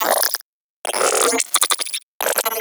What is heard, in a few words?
Computer Beeps; Guild; Warframe; Misc Remaster